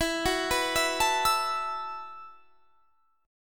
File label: E Suspended 2nd Suspended 4th; Esus2sus4; arpeggiated; 24,21,21,21,22,24